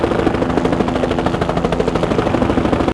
Helicopter medium; chopper; Max Payne

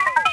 vox, droids robots

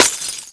glass break

